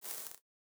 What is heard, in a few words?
fx creeper; drumkits; fl studio; goodtakimu drumkit